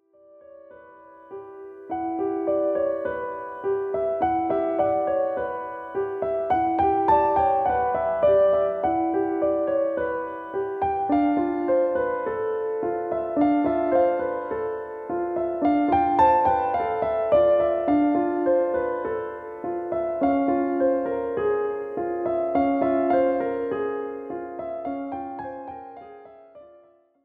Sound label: Floating Clouds